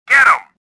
anger, swamp

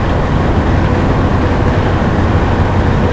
turbine, ambience; cairn

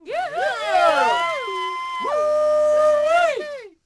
CROWD POS LOW; Cars; XBOX

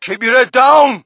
crowd, shout; Mike Tyson Heavyweight Boxing

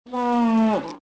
moose; Soldier Of Fortune II Double Helix; XBOX

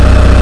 honda eng; Chase Hollywood Stunt Driver; XBOX

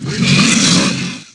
Lrg Spear Spin, Aliens

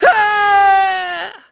fall; Pulse Racer

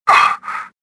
gasp; stofficer

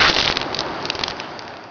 hydrant gurgle; Chase Hollywood Stunt Driver; XBOX

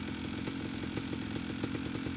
idle, Engine

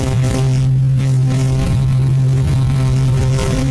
buzzing bee; vehicles

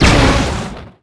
explo energy ball; gungan; impacts explos

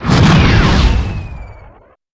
seeker fire; Star Wars Clone Wars; XBOX; Xbox Live Effects

